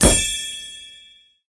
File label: shop buy